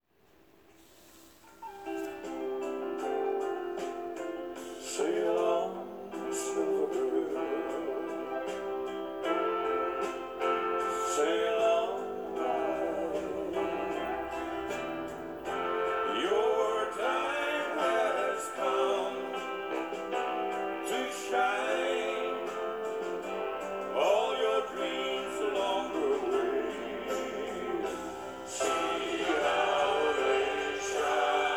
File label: music; musikk/konsert filer for øving uten at stemmene er lagt på